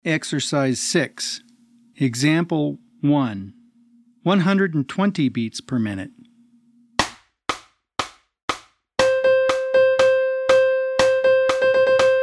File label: 120 BPM